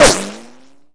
e bullet rich; The Sum of All Fears

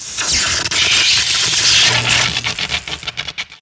zap; Messiah; ELECTRIC

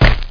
bod strk, bugs; Starship Troopers

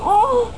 MOAN; Duke Screw'em 38D

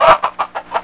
CHICKEN

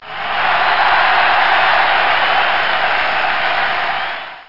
cheer; LegoBlast

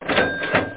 cash; pookah

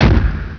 Door Slam; Total Distortion

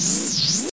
Default Beep; Earth View; Nature

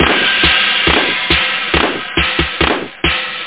breakbeat

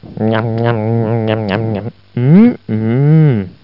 bee eat

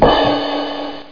snare

